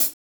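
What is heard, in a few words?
closed hi-hat, disco; Best Service ProSamples vol.48 - Disco Fever; AKAI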